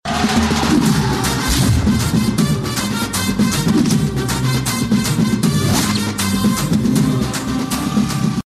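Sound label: background music; blues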